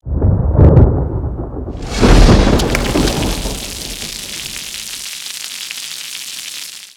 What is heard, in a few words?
Rain Cloud Shake; emotes